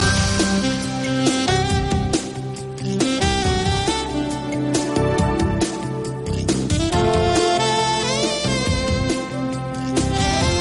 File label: 90s theme